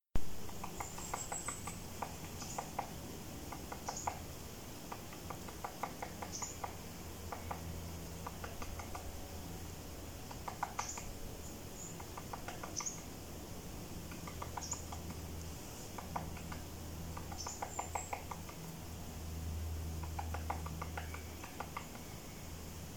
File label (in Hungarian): nagy fakopáncs Dendrocopos major, madarhangok